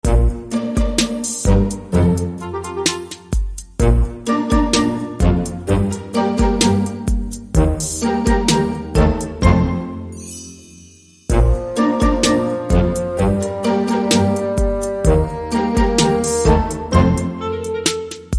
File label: Electronic Drums